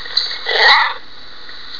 cougar meow

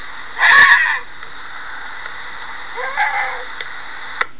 cougar; Loud Cougar

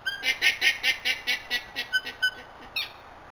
alpaca sound, Alpaca Warning; Alpaca Warning 2

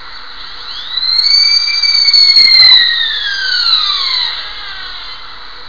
sika buck, Sika Deer; Sika Deer cry

